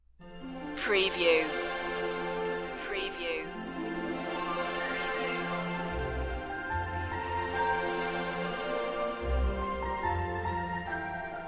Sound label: opening no choir